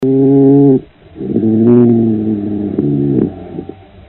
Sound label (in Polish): borsuk